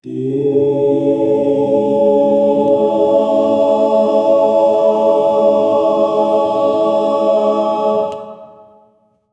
yoruba choral harmony

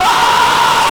tormented soul releasing; eerie silence

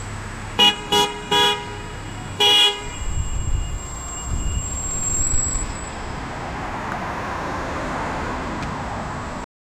public and car and bike

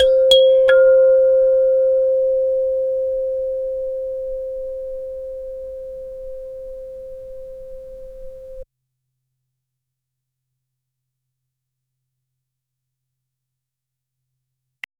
philippine kudyapi, traditional instruments

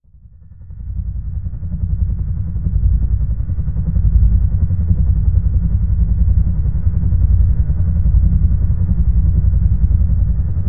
a cinematic slowed down; aerial

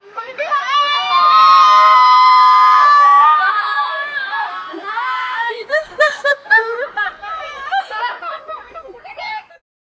a man and a 20, cheering

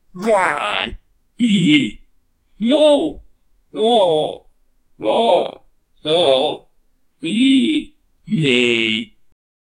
yip yip

